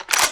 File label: l96a1 bolt up; weapons; swb